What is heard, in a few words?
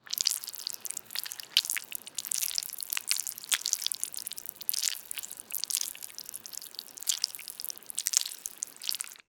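asmr sound of soft squishing, gel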